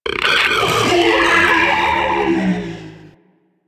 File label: Sentient Ghost Caster Attack, Barks; Warframe; DE; Sentients; Sentient Eidolon Ghost Caster